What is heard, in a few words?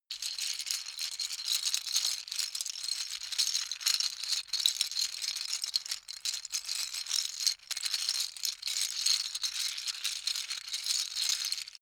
lego bricks handling sequence